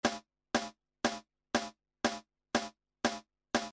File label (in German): Beispiel Snare cmpr gain